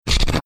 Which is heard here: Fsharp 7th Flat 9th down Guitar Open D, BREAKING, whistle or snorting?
snorting